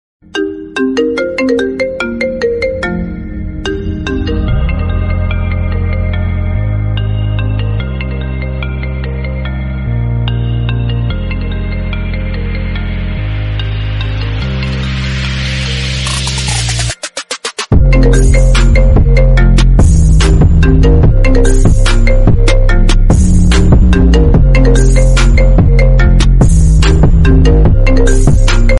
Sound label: Remix Ringtone; Iphone 14 Pro Max